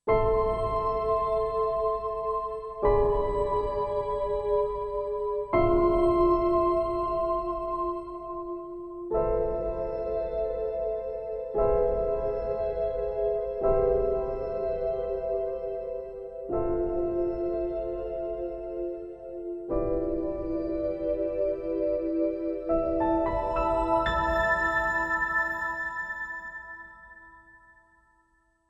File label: Meditation Pad Deep Peace; NADA